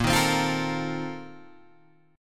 A sharp Major 7th Suspended 2nd Suspended 4th, Guitar Open G